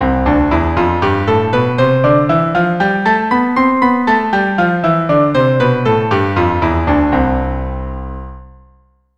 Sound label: C Major 2 octaves apart, keyboard